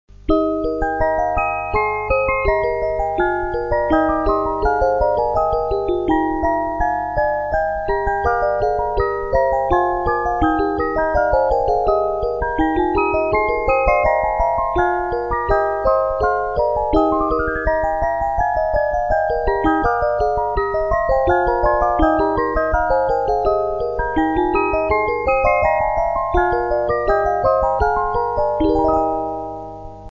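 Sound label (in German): Alle Vögel sind schon da, music box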